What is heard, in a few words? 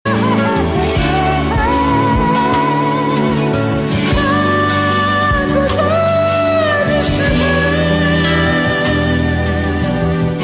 Soft Tone; Guzaarish